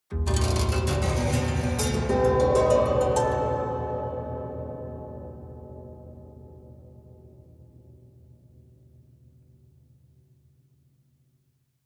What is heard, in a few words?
haunted strings up stroke slow hard